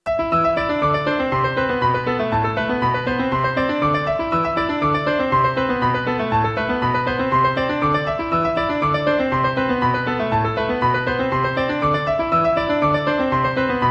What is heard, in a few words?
bassline